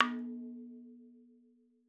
madalam notes R; MADALAM 110 7 8; Sample Banks; Percussion; MADHALAM

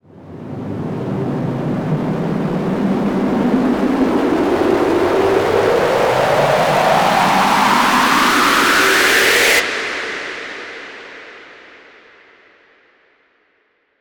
FX Uplifter; Sample Banks; Percussion

